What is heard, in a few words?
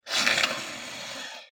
arachnid scream